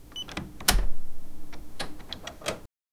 futurustic biolab card re

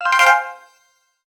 positive selection sound

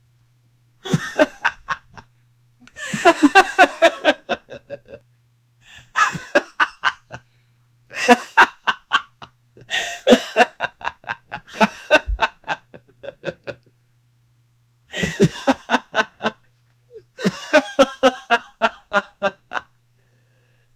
a 22 year old guy, laughing

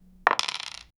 dice rolling quickly on a

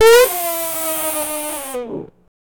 sound of fail

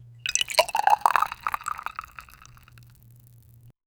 a close up of a drink

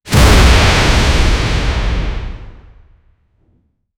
brass

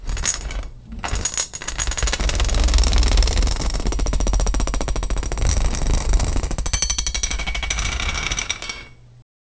violent rattling of small, earthquake

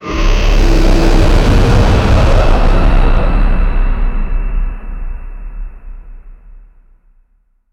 damage

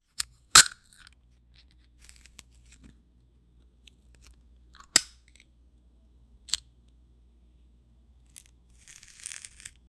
the sound of opening a, crinkle

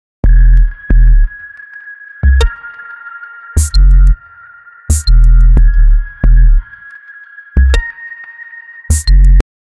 deep house track blending, melodies

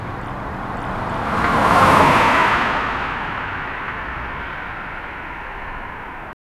cars are hitted by road; accidents

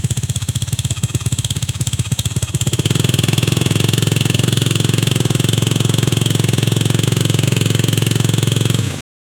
a deep motor bike engine

